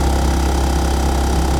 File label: KART IN ON VERY LOW, kart